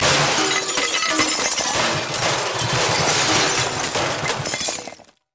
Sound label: Long Junk Crash; Action, Impacts & Explosions